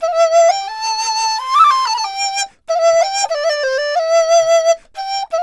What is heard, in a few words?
DIZU, 90; INIS - Opium; DIZU 90-C